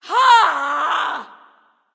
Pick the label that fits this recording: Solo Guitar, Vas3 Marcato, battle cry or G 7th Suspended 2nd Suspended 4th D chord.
battle cry